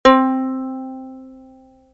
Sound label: ukulele; TamTamMicro.activity